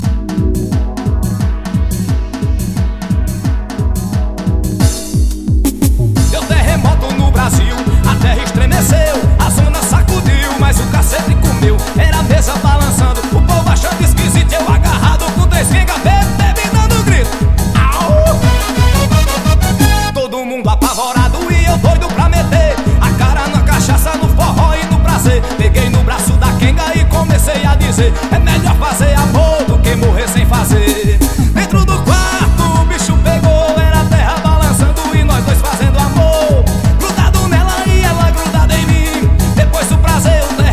forró dance terremoto; jovem hits

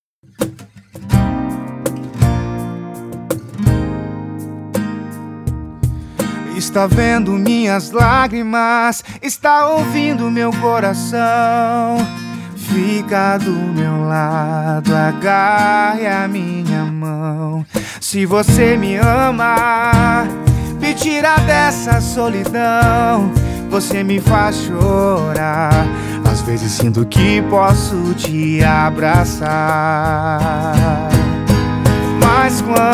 sertanejo universitario sofrencia

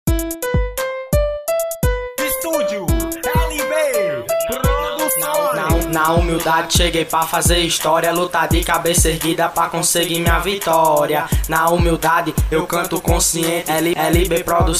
rap conciente

